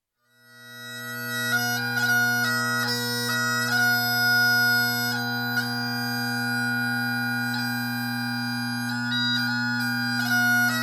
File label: Bagpipes